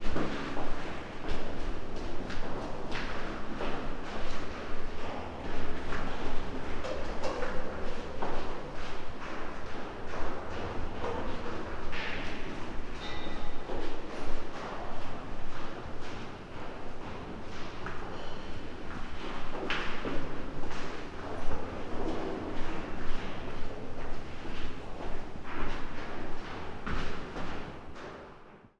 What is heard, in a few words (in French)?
escaliers; bry